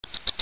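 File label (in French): Bécasseau Minute; Scolopacides; Oisellerie Galan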